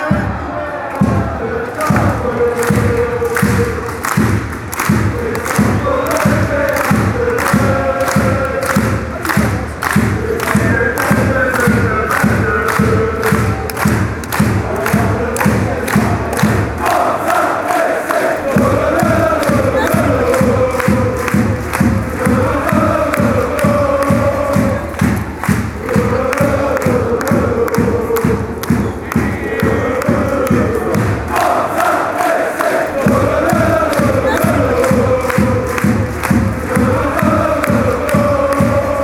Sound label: forza racing lololo